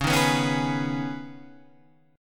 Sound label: C sharp 7th Suspended 2nd